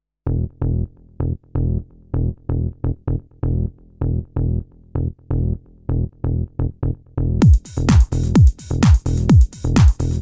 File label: Instrumental Rain Mix